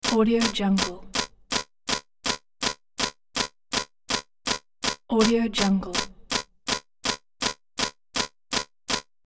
on off static button switch

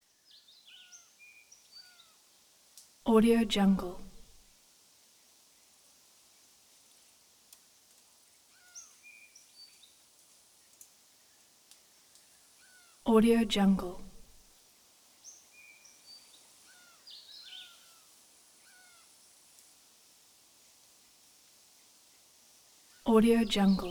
mystery forest ambience wind in trees

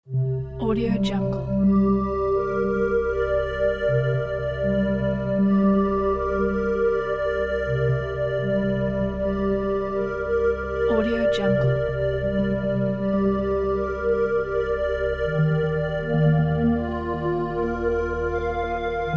ambient soundscape

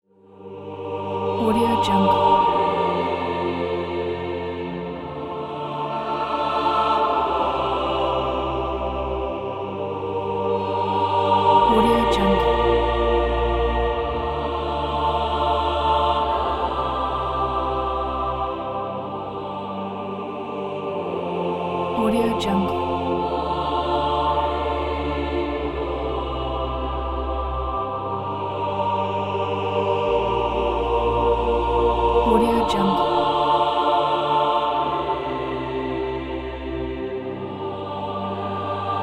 church choir

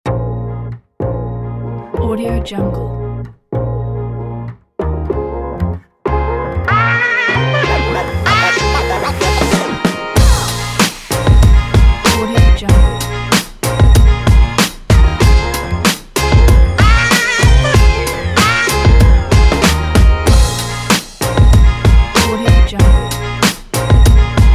hip hop life

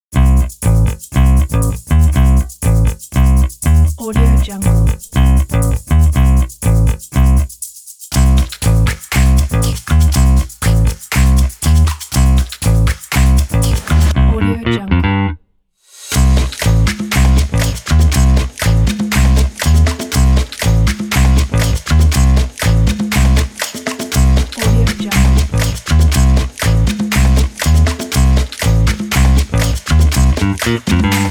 bass guitar advert

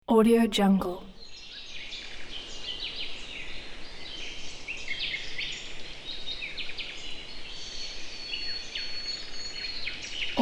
chirping birds

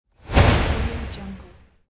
soft airy

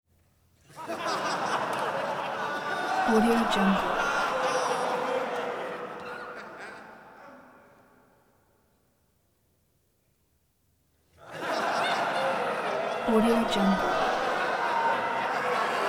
prison crowd laughter male reaction happy; prosoundfx

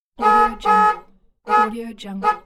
car beep

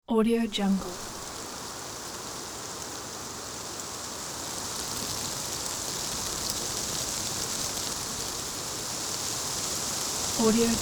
wind tree leaves rustle

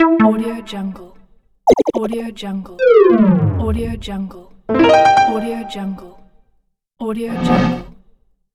interface mobile games sfx

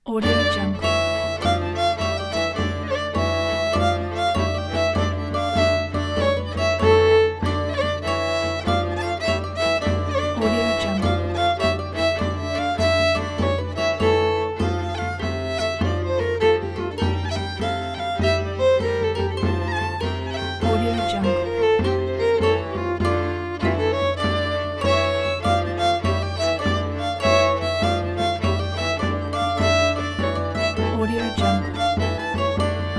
medieval wedding

